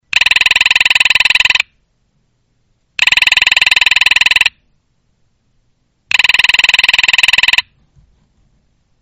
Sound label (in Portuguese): Telefone Militar